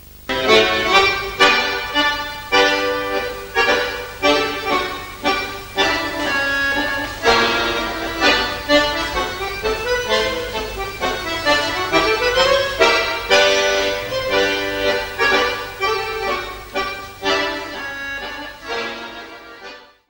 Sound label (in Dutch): Wals - Belgique; Wals zonder Naam